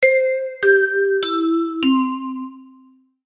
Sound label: chime; maoudamashii